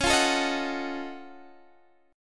Gb Minor Major 13th Db

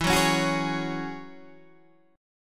G sharp 7th Suspended 4th Sharp 5th E

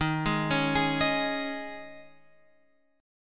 D sharp 7th Suspended 4th, Guitar, Open F; D#7sus4; arpeggiated

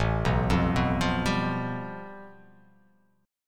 A 7th Suspended 2nd Sharp 5th

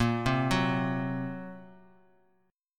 Bb Suspended 2nd Sharp 5th; Bbsus2#5; arpeggiated; x,13,10,11; JGuitar

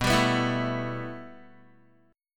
B 7th Suspended 4th Sharp 5th, Guitar; B7sus4#5; strummed

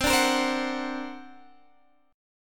D Augmented 7th C, guitar

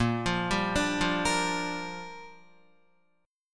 Bb 6th, Guitar; arpeggiated

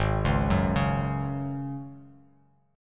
G sharp 7th Suspended 4th G sharp; G#7sus4 arpeggiated; 4,4,4,6; JGuitar

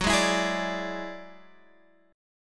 Gb 7th Suspended 2nd Sharp 5th, Guitar, Open G

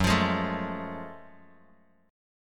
F sharp Minor Major 9th, Bass Standard; strummed

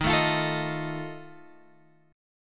D sharp Suspended 2nd Flat 5th, Guitar, Open G

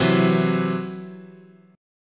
C Major Flat 5th, guitar chord

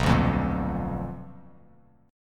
B Minor Major 7th Flat 5th Bass Standard; strummed; JGuitar

